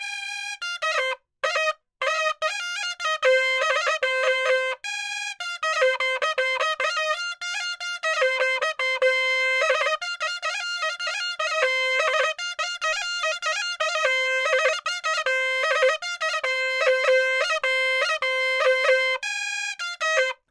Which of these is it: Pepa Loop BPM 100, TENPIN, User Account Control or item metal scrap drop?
Pepa Loop BPM 100